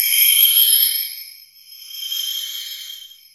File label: PHASE SWISH; Zero-G Ambient 1; MISCDIGIPERC